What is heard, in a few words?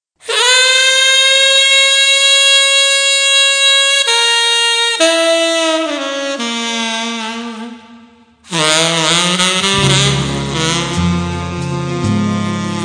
Bolo Blues